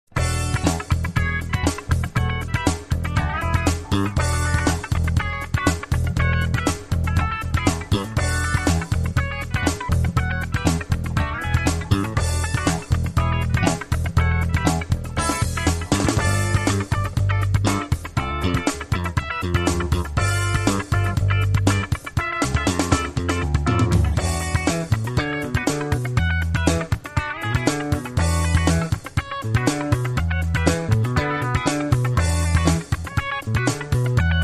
Funky

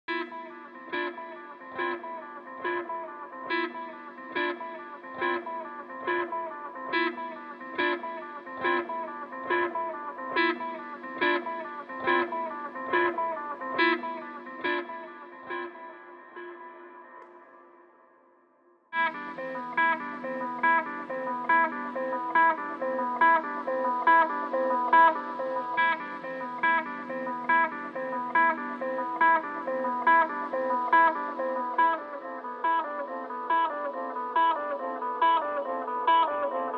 Guitar Loops Only

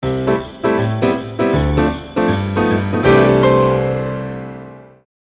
Jingle Bells

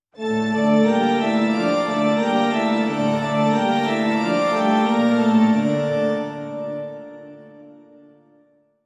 Sting

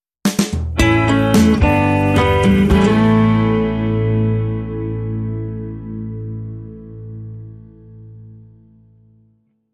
Acoustic Harmonic End

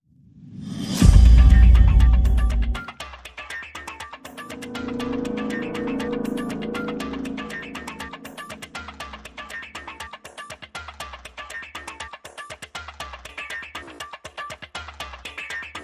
Perc Pulses and FX Only

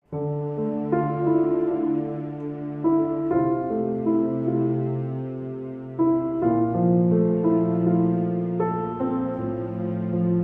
With Strings

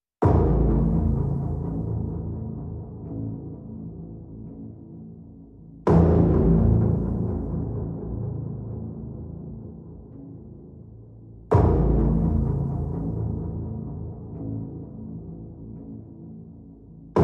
Pads And Pulses Only

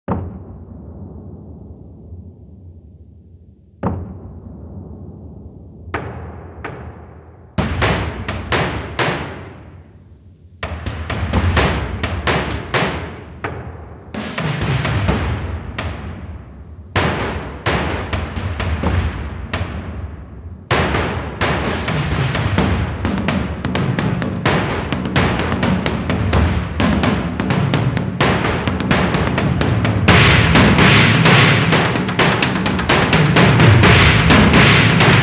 Mid Drums

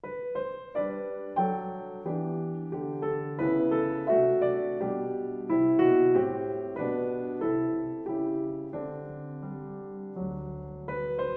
Solo Piano

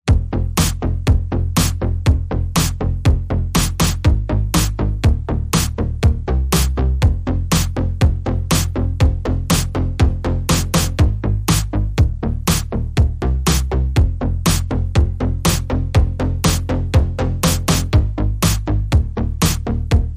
No Lead Synths Mix

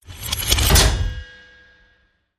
Glitch Unsheathed

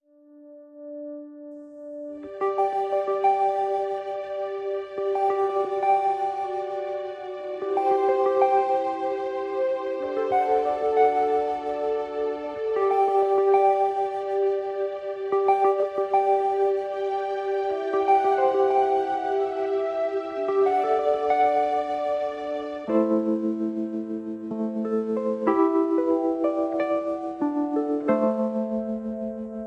Pads Only

